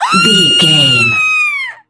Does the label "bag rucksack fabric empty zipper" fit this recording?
No